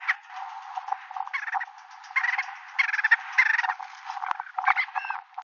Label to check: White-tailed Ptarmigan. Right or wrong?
Right